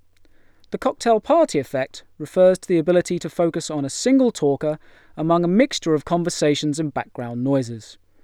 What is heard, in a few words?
cocktailparty; ltfat